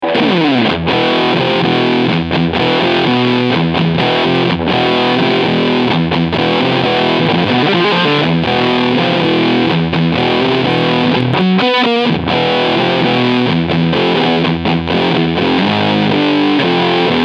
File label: Full Gain High Cut